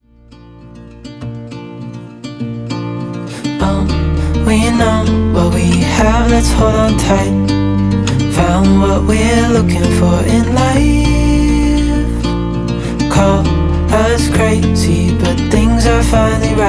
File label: E Acoustic